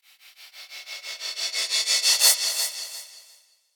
Reverse Fx; musicradar

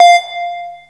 bleep

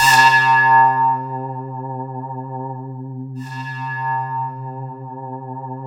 C3, SynthLine; 90 s Sample CDs; NiceBeats; SynthLine VOL-16

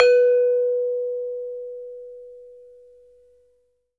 SARON B3; Spectrasonics; Heart of Asia

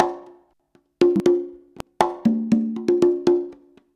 CONGA 119, Modern Percussion Loops

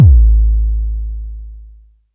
m base, Jomox Mbase01

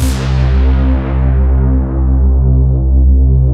SYN, SYNTHS; Pro-Rec - Synthsonic

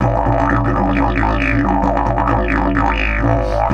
130, Didgeridoo

